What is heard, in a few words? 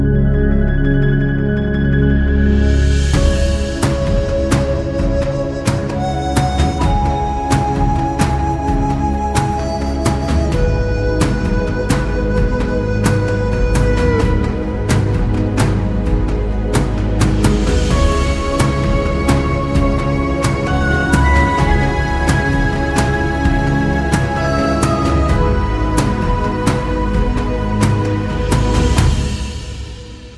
soothing mobile ringtone